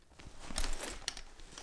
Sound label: crossbow admire, weapons